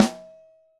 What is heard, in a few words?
Snare Top; Ableton Foundation FreePacks; Snare Designer